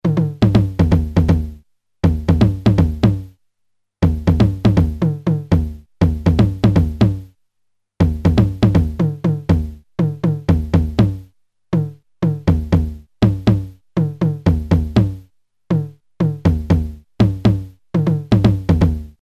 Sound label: TR909 Toms